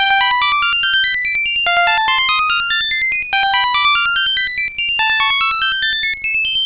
Game Boy Synth; 8-Bit; Chiptune

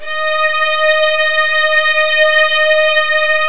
D#2, Virtuoso Strings

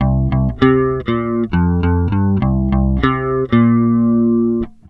NUTS 98 C, Bass Grooves; USB Soundscan vol.30; AKAI

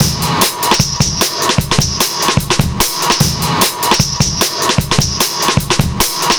150, Drum Loops, Drum & Bass; Total Drum&Bass

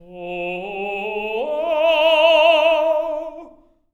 LYRICAL; Spectrasonics; Symphony of Voices

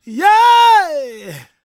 gospel male, vocal; ILIO; Vocal Planet

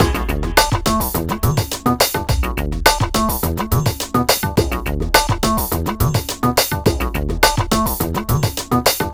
Indio groove (w bass) (in B); Sonic Foundry (Sony Creative Software); Ugly Remnants VOL-2

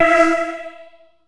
Synth Hit E

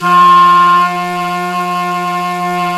Flute, Singin' Flute

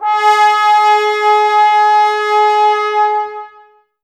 Brass, Woodwind Brass Ensemble; Miroslav Vitous - Woodwind Brass Ensemble; Tbs

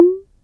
SYNCUSSION

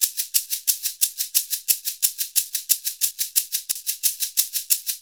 SHAKER, Modern Percussion Loops

